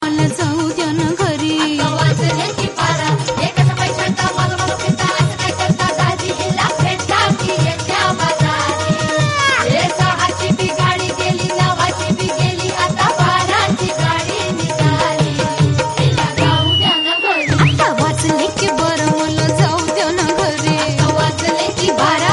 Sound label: Marathi Ringtone